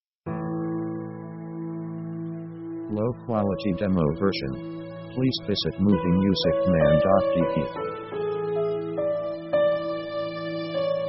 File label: orchestra romantic; orchestra themes